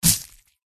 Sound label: attack contact; haosa